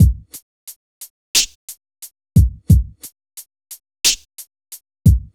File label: The Beats with sep EQs; Conquering EQ